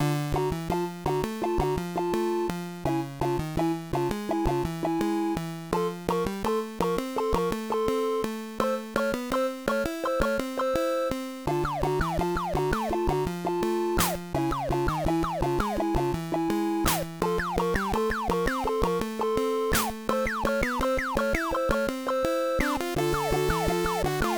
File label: chilly oriental feeling with laser shots c64 style